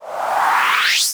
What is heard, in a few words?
Sweeps Short